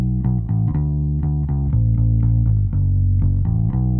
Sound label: bass; musdb18